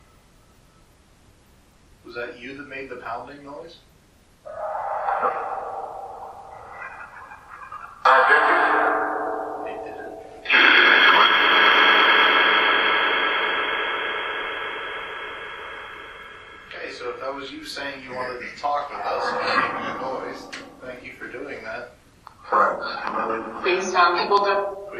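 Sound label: 1st Floor Doll Room Phasma Box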